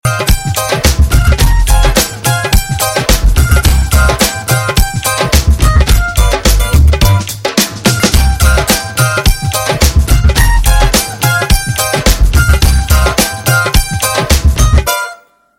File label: Bgm